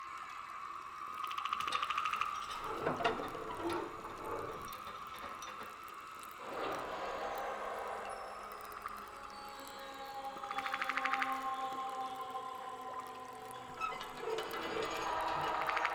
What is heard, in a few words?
amb thresh exterior; lol